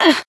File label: Voice damaged; hiina